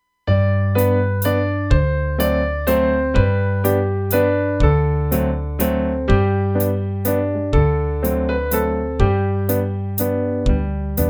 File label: Come Come Whoever You Are Karaoke Version